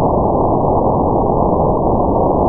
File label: randchirp snr 12b; chirplet chain